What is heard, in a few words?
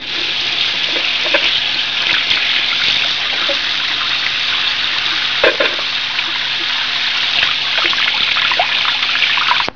hand wash